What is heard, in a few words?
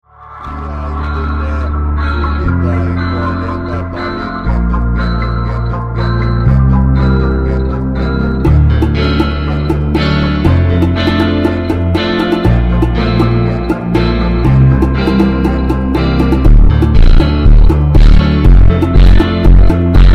Light Funk; Doors; Roblox Doors; ringtone